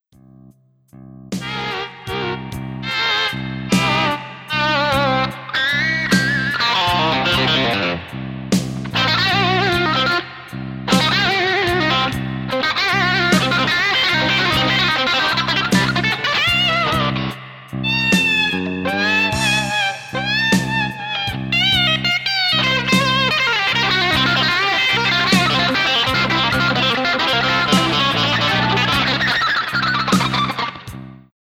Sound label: Tortured Tele